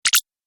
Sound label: Laser Unlock; Laser Sound Sound Effects